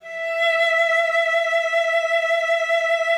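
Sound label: cello vibrato, E5; Xsample VOL-7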